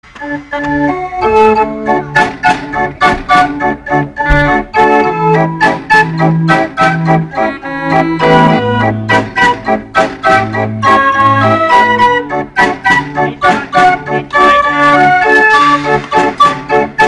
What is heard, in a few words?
barrel Organ Orchester